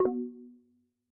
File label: calls they left call; Slack; macos